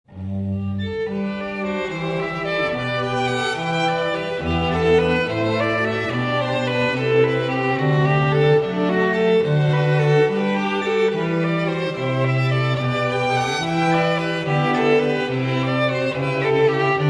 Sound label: String Ensemble; String Quartet